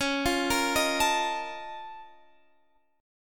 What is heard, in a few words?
Bb Minor Major 11th Db